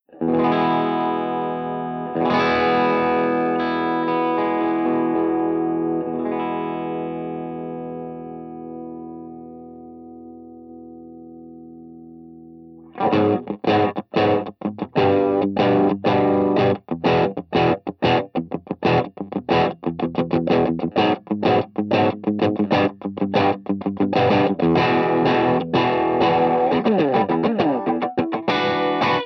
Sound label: BASSMAN